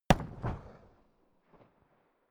rifle ak-47 exterior fire single distant; comedy cartoon; pistolen gewehre; Pistols and guns

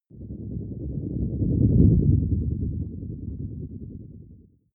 whoosh storm wobble; swooshes-swishes

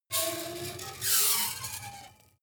metal screech phaser fast

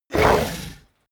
coloss groan pain short; kreaturen

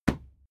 fight impact sweetener cloth muffled; nahkampf; soundpool

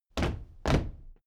step military march stop

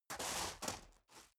step boots gravel medium scuff; schritte

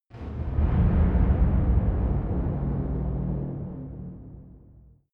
heavy dark door

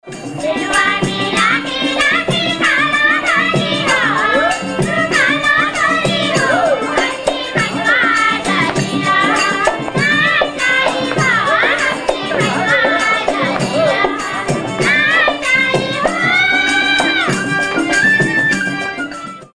song from nepal; music elements; grooves

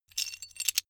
metal pieces clatter short

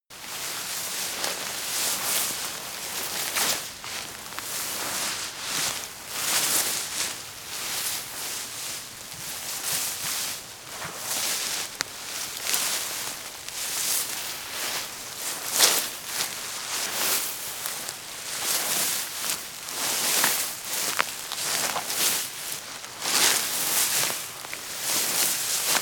step grass shuffle; schritte; menschen

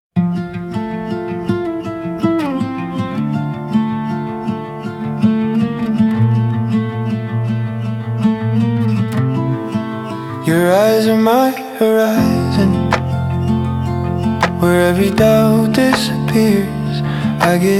acoustic indie

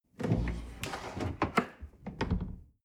dishwasher door close

